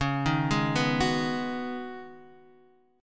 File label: Db 7th Suspended 2nd Suspended 4th, Guitar, Open D